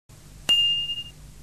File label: alarm